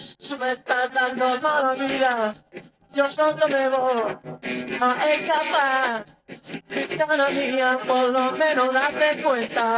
voice

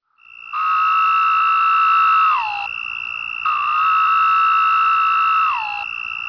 cicada cricket mix

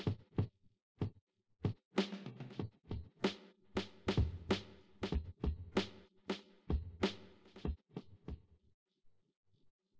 drums

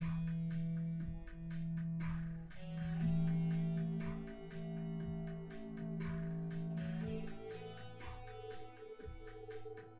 drums synth conv 1m sim; SASSEC07

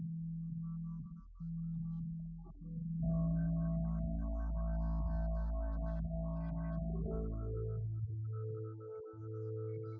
wdrums synthconv; SiSEC08; SiSEC underdetermined

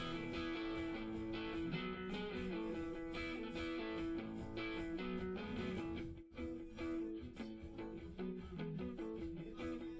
drums, live rec

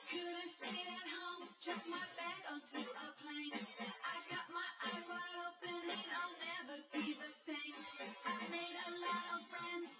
wdrums; SiSEC08; SiSEC underdetermined

